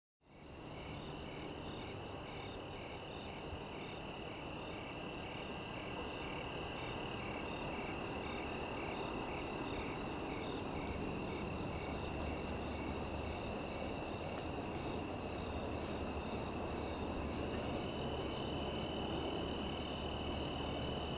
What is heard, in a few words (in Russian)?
shum nochnih sverchkov